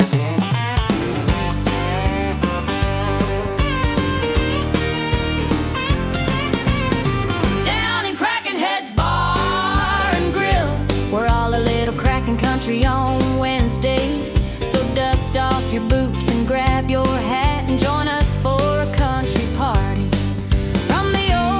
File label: Country